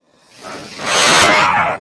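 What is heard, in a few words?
Caiman attack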